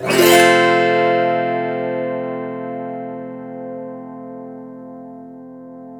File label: AUTO HARP, Auto Harp Majors